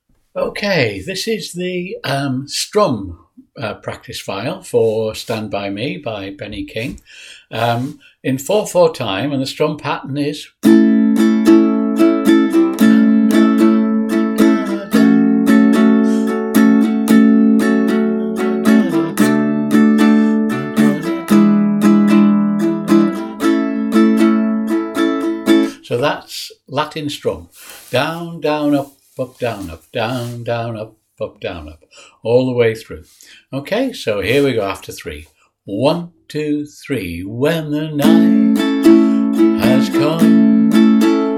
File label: Strum Practice File